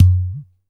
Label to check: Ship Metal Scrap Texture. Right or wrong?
Wrong